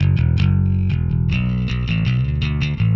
162 Indierock Bass Am; Rock Hard

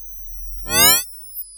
Crystal Gyroscope, Reverbs; Convolution; IR Samples; Kontakt 5; Unsusual Reverbs

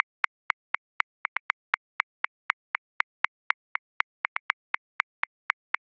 Straight Three Rock 3/4, Grooves; Drum Booth; Ableton

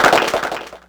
Clap box Pitch 4; mpk mini presets; Ableton Foundation FreePacks

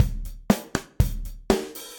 Kit Session Dry, drums; Standard Kits; Ableton; Session Drums Studio

